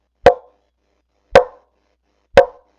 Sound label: Saga Plywood Moh Resonator